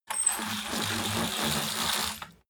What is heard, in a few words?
roll-down curtain roll down